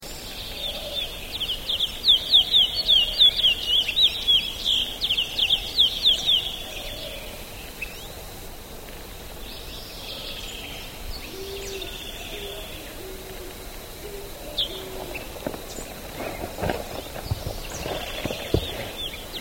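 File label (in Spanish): reina grande, Reinamora Grande, Cyanocompsa brissoni, Ultramarine Grosbeak; cantos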